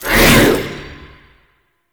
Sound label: crack cast; Warframe; Khora